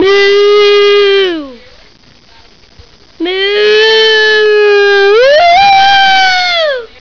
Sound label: moo